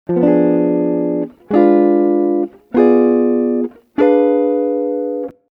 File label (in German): Verminderte Akkorde